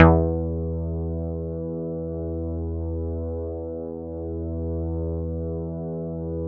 bass, synths

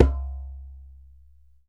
DJEMBE, African Perc

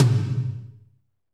tom, Kit Wet; Northstar - Drumscapes Roland

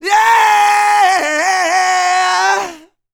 R ROCK, MALE HUMAN; ILIO; Vocal Planet

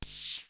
KLONE NOIZ, Klone Dual-Percussion-Synthesiser; KLONE NOIZ NW8

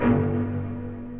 Odd Kick Layer

